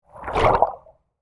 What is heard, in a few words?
Underwater Pass By, Water, Underwater, Movement, Pass, By, Hydrophone